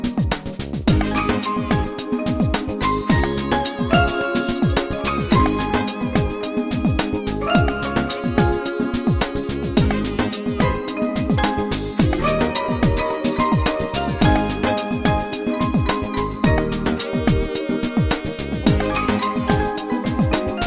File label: Classic Funk